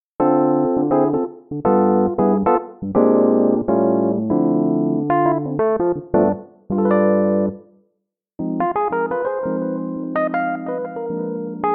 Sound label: electric piano; lounge lizard; Mixcraft